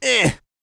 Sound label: A YELL, MALE HUMAN; ILIO; Vocal Planet